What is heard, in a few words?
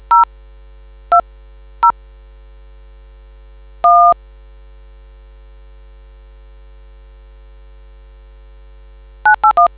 dtmf